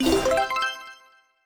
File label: Special & Powerup, Interface and Item Sounds; ArmyRaid